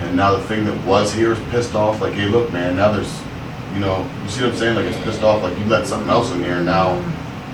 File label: growl moan